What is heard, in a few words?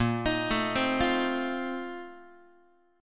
Bb Major 7th Suspended 2nd Suspended 4th, Guitar; BbM7sus2sus4 arpeggiated; JGuitar